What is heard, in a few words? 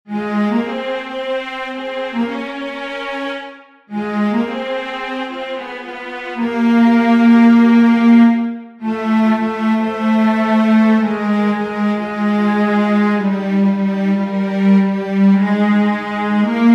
tenor; Begynd dagen med en sang